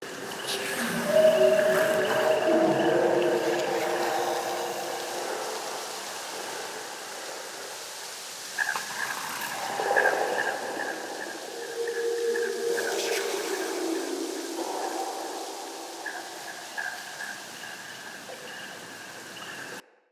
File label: Day Amb Loop, Ambience, Glades; Glades Of Andurin